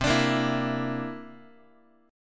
C 9th C down Guitar 4A, 4E, 5A, 5D, 5G sharp, 6C sharp; C9 strummed